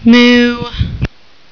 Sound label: moo